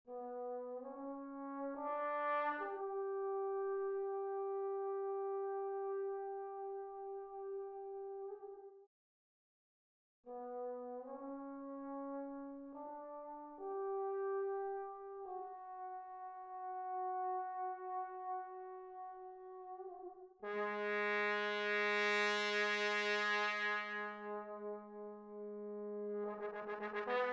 Trombone

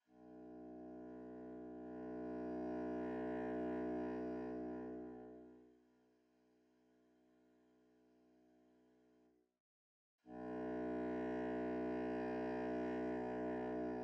Contrabassoon

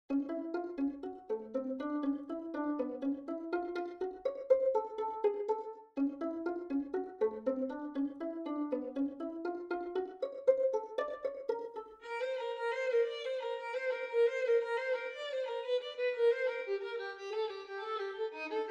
Violin; Rondo

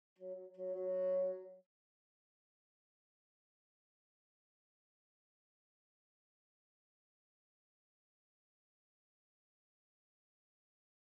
Bassoon

